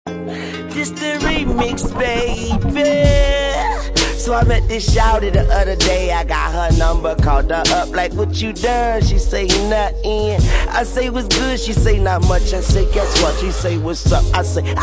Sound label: crying out